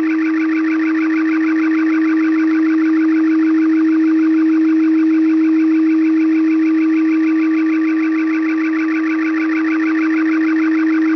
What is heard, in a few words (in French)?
porteuse et qrm usb; v32 un emetteur radio dans la guerre